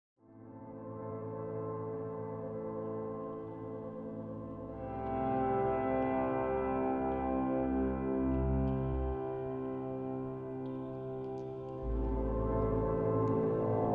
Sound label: Processed Bottle and Piano; salty grain off